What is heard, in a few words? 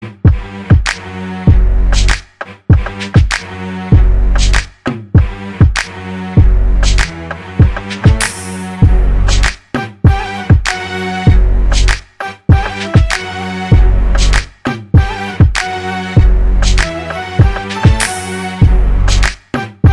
instrumental